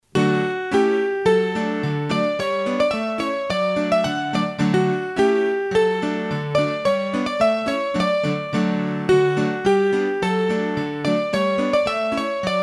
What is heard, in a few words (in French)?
Bourree a Malochet, hurdy gurdy; trad; Bourrees a 2 Temp